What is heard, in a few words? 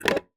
Single Shunt, DVD Drive External